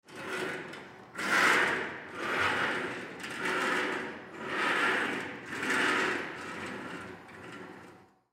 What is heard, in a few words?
Fire Truck Ventilation, Fire Truck Ventilation Hose Pull; Emergency; Emergency Vehicles